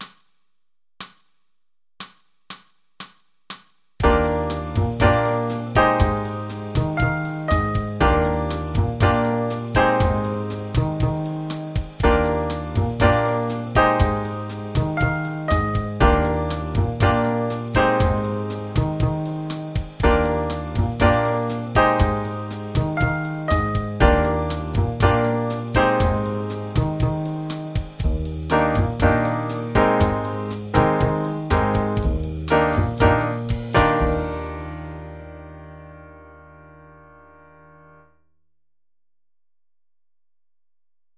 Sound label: Jazz 7 8 Improv Background